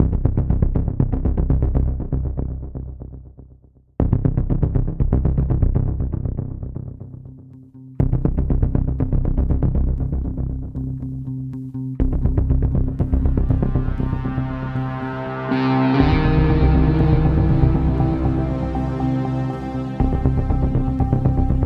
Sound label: Ambient Core